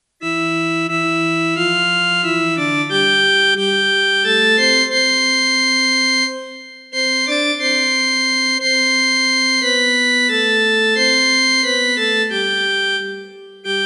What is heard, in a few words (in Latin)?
TANTUM ERGO ORGANO; GREGORIANO